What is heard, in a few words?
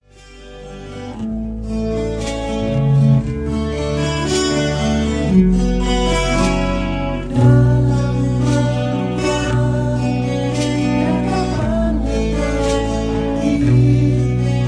karaoke minus one